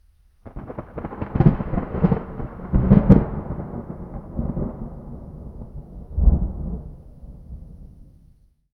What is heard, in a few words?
sharp crack of thunder followed by distant rumbles